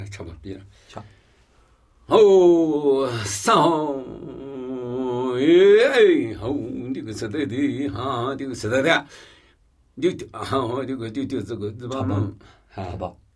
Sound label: Namuyi Tibetan Ritual Chanting